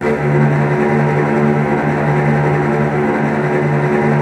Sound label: STR TREMOLO, Strings